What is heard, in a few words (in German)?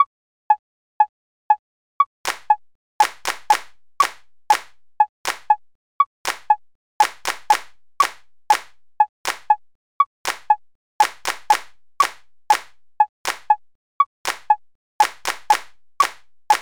viertel achtel ueb 120, rhythmik